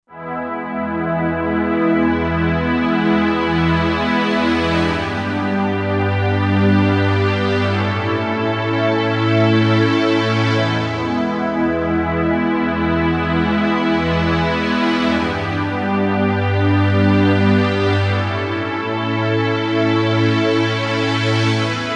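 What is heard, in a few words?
Pad Lazy Sweep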